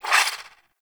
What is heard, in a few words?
Swing, Weapons Melee; Warframe; Modular Melee